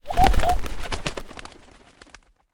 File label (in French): Oiseau Senvolle